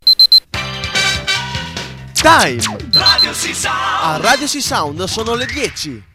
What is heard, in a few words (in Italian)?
JINGLE